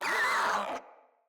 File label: Infested Crawler Hear Sound, enemies; Crawlers